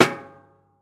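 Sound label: drum solo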